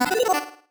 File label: marimba dice; ゆめ2っき